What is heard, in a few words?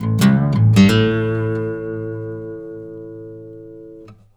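baritone lick A, Blues Guitar; ILIO; Fretworks; 108 BARI LICK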